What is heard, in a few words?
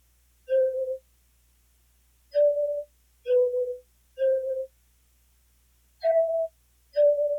Various Melody Loop - 130 BPM A Min Synth; Loop Stems; Cymatics; 2020 Melody Collection; Various Melody Loop 4